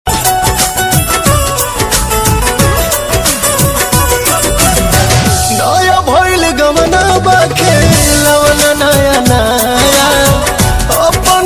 song bhojpuri

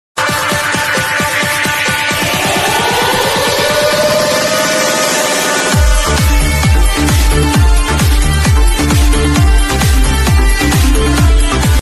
Dj Remix

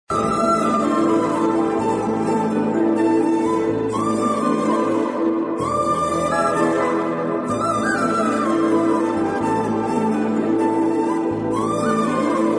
Flute Music

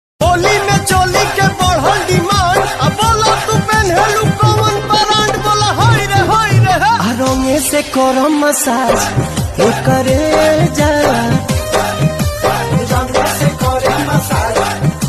Holi Song

